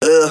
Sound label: ooh, Voice